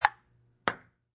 Sports Ping Pong Table Tennis Serve Pad Hit Ball Impact Table